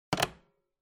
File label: Telephone Hardware Receiver Hang Up